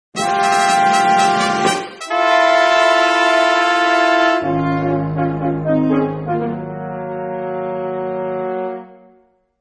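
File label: Fanfare, instrumental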